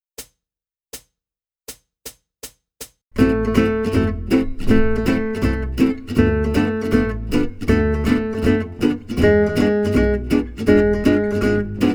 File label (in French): Rythme swing, jazz manouche